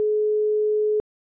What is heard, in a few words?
ringback tone cept; iOS 26 Sounds